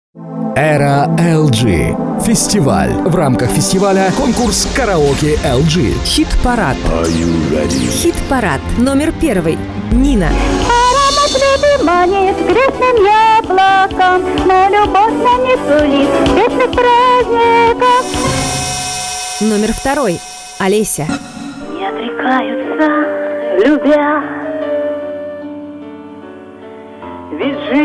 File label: karaoke